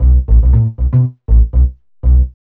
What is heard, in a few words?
B LOOP